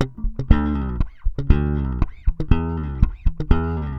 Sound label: SM BS C, BASS SAMBA